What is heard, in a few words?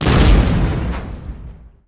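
explosion small generic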